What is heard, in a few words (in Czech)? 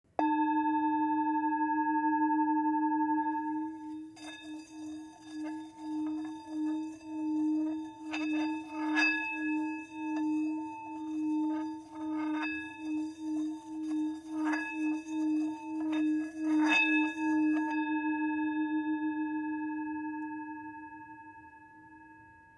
tibetska misa